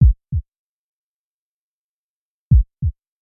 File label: Sting Heartbeat; Brand X Music; Brand X Music Catalogue - Editor's Essentials